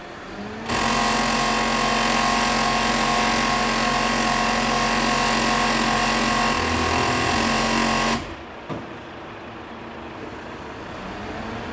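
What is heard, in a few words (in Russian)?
цементный миксер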